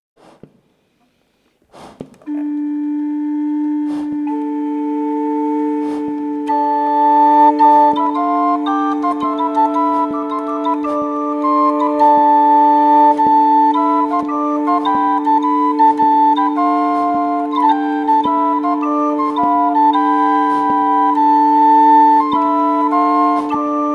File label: portativ; anonym